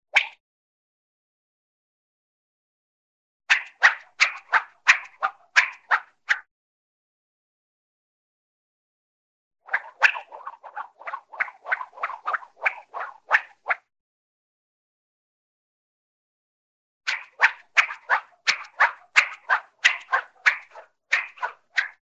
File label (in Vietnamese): Tieng kiem roi chuyen dong nhanh